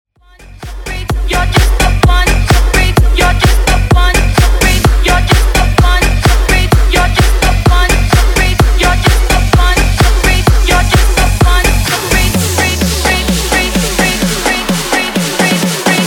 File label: Bootleg Smashup Acapella Out 128bpm